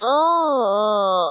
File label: ohhhh ohhhh